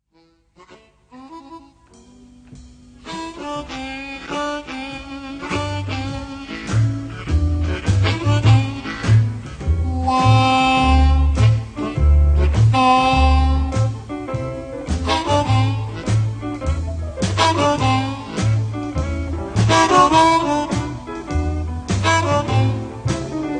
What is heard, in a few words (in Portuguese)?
musica